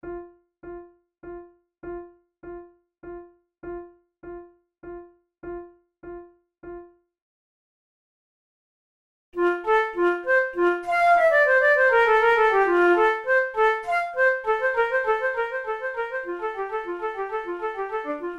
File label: bach invention no8 in f major duet, flute